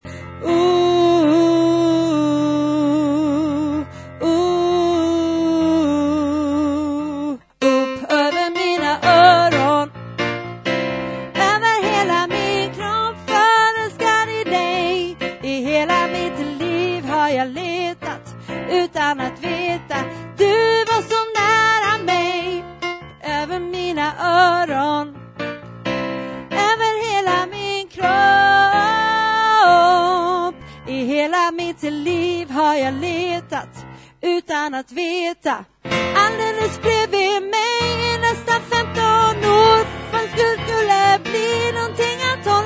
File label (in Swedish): upp over mina oron alt, stammor